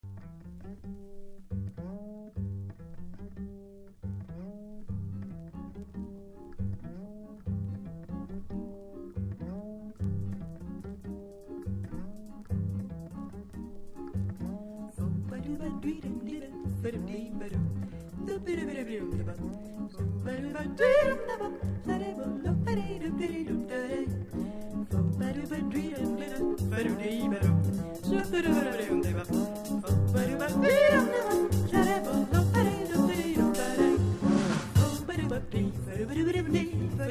italian vocal

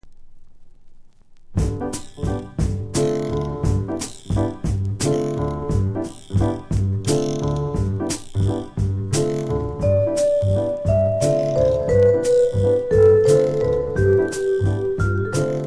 london jazz

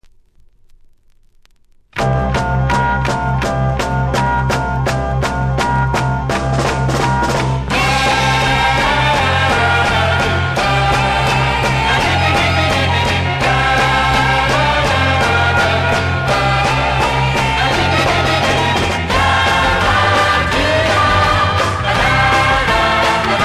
beat in studio

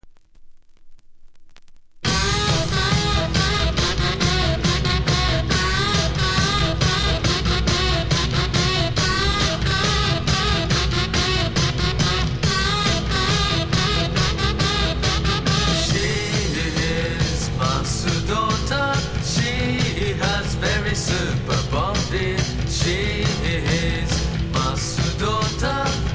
salon music wrapped duet

